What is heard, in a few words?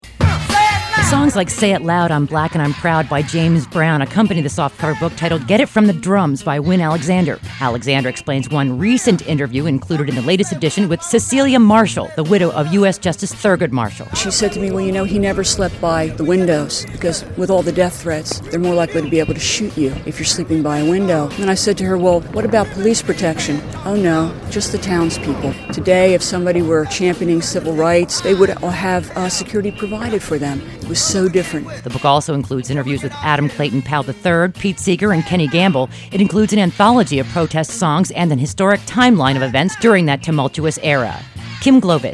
Radio Drums; KYW